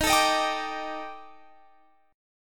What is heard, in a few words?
D sharp Major 7th Flat 5th, down, chord